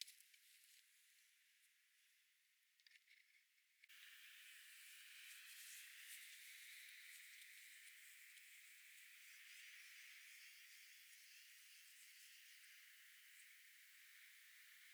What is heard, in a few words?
DRONES